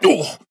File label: Grunt Hit; Androxus; Paladins